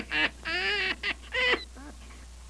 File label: sn laughs